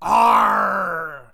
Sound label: arrr